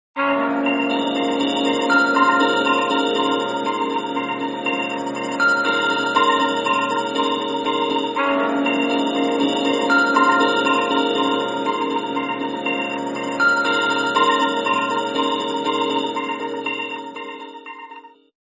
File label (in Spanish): timbre sintetizador piano; whatsapp